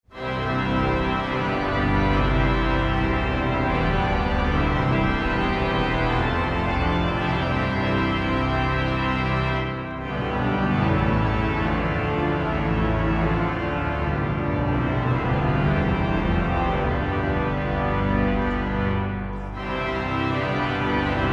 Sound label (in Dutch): Improvisatie Thema Orgelsymfonie